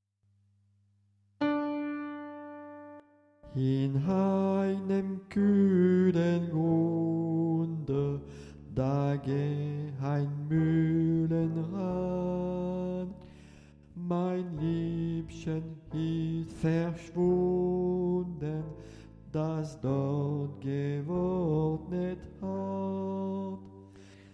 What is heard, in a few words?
alto